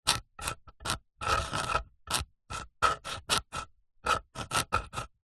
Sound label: office pen biro draw shapes or diagram on wood